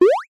multimedia game sound classic retro jump

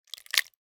horror guts bloody splat squelch in plastic bowl

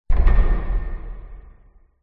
impacts wood and metal thud deep dark reverb; zapsplat

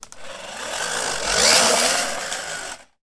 toy motorcycle or car push past; sound effects one

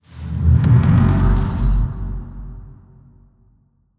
Cinematic Transition; soundbits

